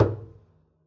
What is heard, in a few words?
pizz noise high thump, double bass; dsmolken; noises